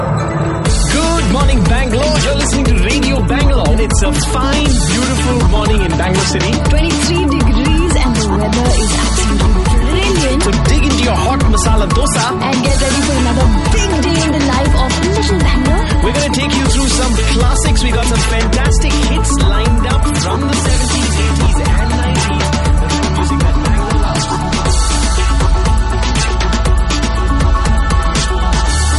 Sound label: Radio Music